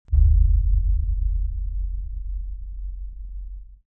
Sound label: Sideways Town Giant Floor Panel Arrive Rotate Lock LFE; Boomtown; Titanfall 2